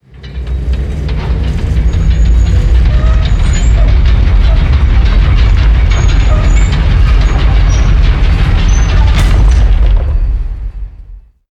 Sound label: Pillar Dry